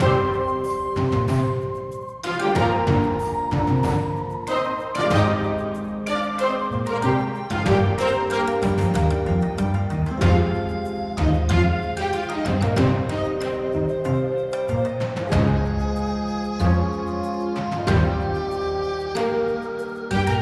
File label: Music Map; Shadow Bug